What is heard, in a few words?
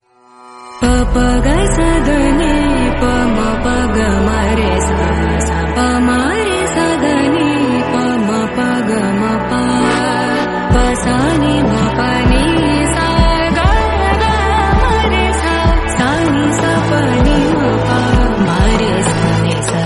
bgm, tamil song; doctor